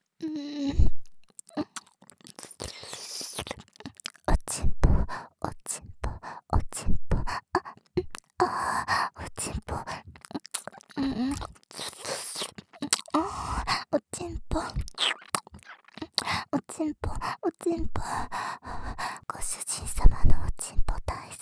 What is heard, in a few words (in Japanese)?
耳舐め 喘ぎ; 右差分; お屋敷メイドのハーレム淫語ヘヴン; バイノーラルオナサポ音声